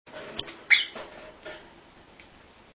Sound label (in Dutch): voeren, agaporniden; v 2 jr; m 2 jr